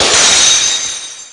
shattering

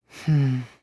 "hm"; izuru

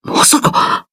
surprised; koma

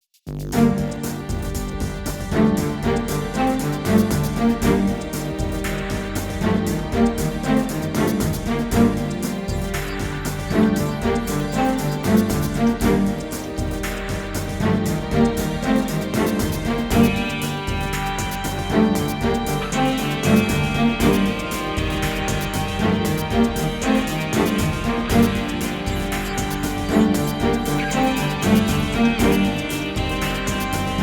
suspense

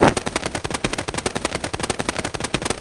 wings; alita

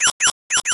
blips; runescape

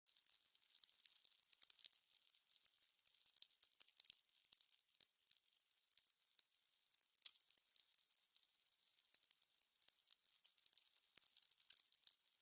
ambience torch

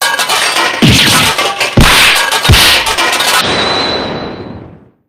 open machine

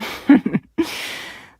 giggle; ningguang

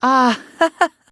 a haha; teruteru

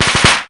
firecracker pop; venus

